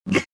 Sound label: jump